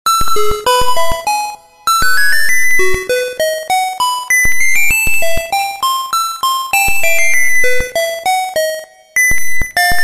game over; the legend of zelda oracle of seasons

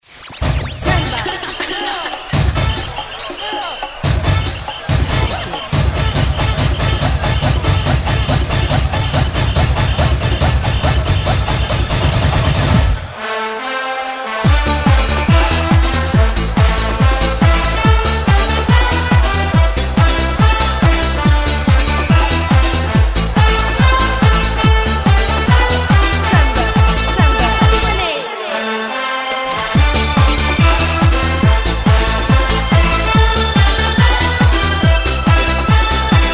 samba

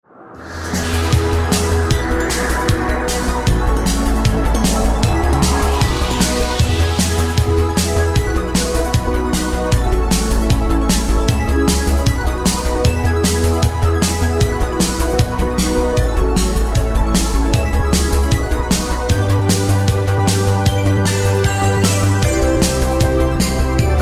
instrumental

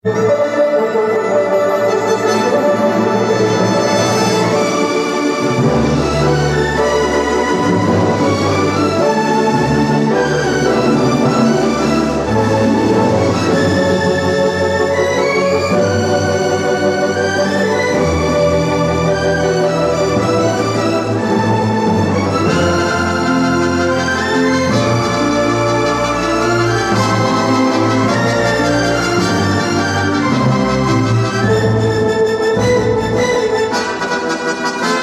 ringtone

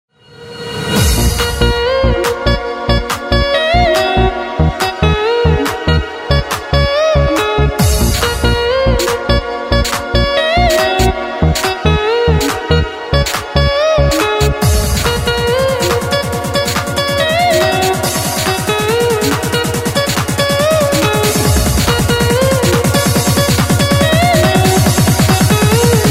ringtone

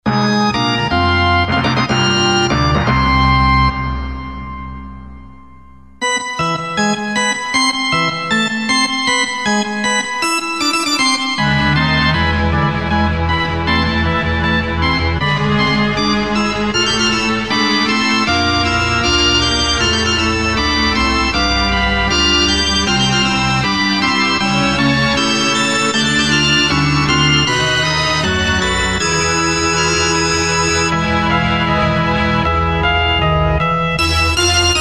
snes stage clear gameover; super castlevania iv; konami

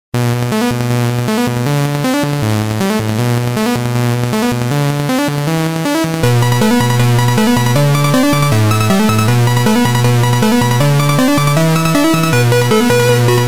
ringtone; comic bakery

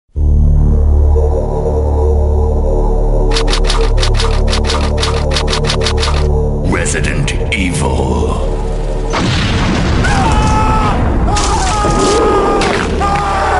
ringtone; resident evil

